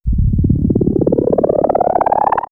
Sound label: Accelerate, synth fx; StarTrek; X-Static Goldmine